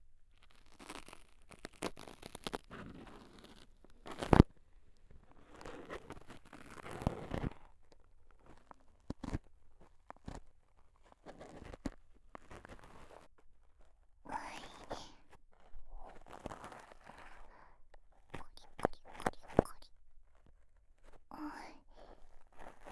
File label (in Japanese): 左耳 耳かき ループ音源